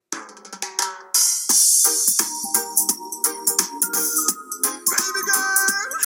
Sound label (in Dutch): Muziekfragment 90S